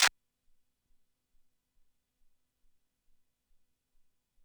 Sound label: Clap, Drum Machines, ELKA X1000; 300 Drum Machines